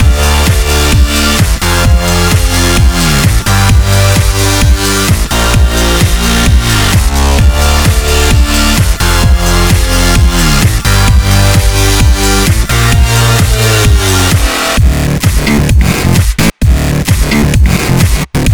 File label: boss level loop